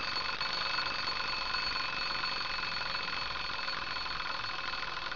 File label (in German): WECKE